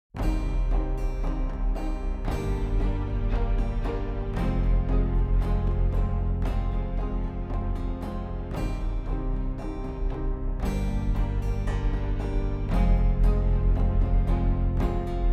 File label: cinematic